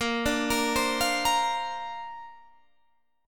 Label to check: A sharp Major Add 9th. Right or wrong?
Right